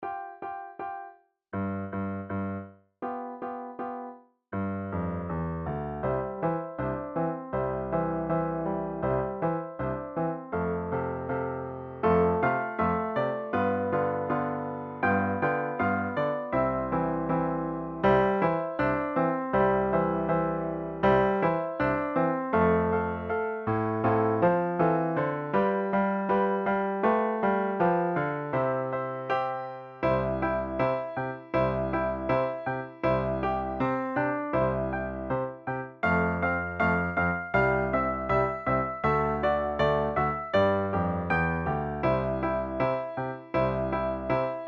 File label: Jingle Bells For Three